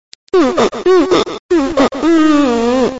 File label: cry